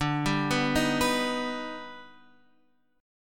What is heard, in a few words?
D sharp Augmented Major 7th D, chord